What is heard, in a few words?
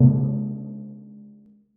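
timpani p left hand c2; Percussion; Sonatina Symphonic Orchestra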